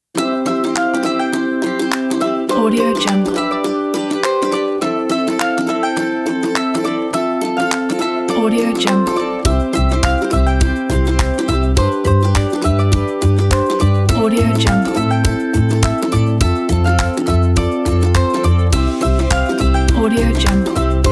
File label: happy game